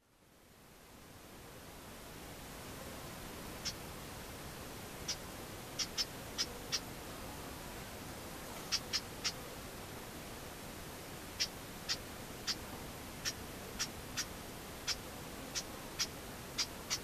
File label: sound bird